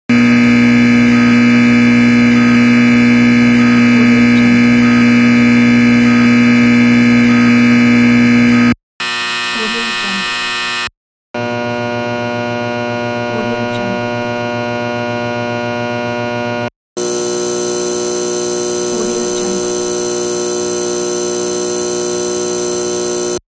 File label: neon lights hum